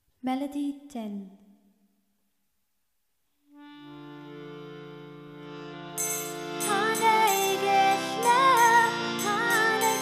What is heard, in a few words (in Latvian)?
hare krishna melodija, easy harmonium; sankirtan; badzan